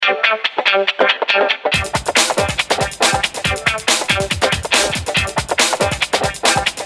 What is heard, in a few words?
Hip hop; Samsung SGH-F300